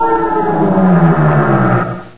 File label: pain; Source engine